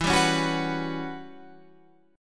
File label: E Minor 6th Add 9th guitar chord, Open F; Em6add9; strummed; 16,14,13,13,14,13